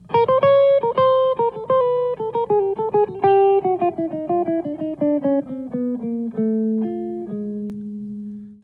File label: Riff